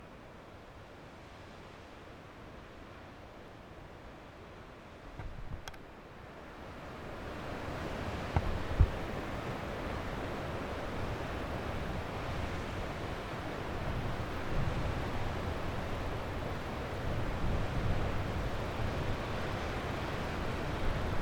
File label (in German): Meeres Rauschen